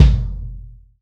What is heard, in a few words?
ROCK CKIK, ROCK KICK